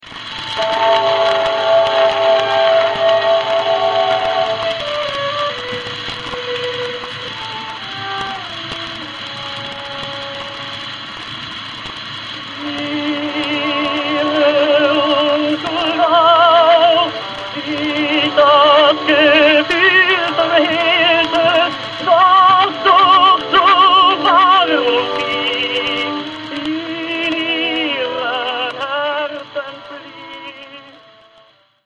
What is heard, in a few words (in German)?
Romanze